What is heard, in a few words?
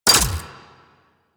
Flak Cannon Mech; Railjack